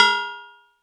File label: Cowbell